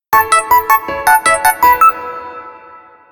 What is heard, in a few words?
Jumping Notes, notifications; Explay A400